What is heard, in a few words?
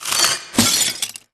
death; durax